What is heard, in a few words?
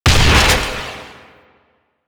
Shot, Weapons; Corvas Prime